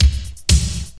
123 bpm 2000s disco drum groove c sharp key, kick tuned to the C# note